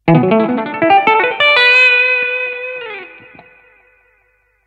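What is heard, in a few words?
Lick, MODERN TAPPING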